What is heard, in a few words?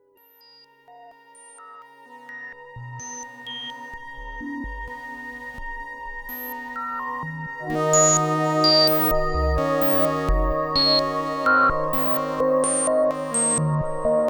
Boomstar 4075 VCF Random Mod